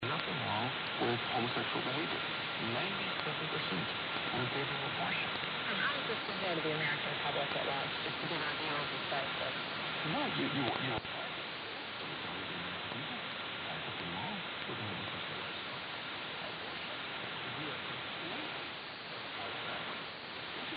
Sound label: Catholic Radio 9390khz